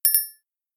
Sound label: notification; samsung Aleart